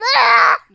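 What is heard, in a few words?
bob grunt; Messiah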